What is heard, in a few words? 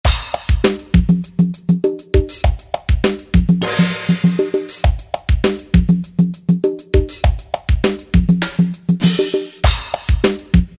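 Perc Jam beats; E-mu PK-6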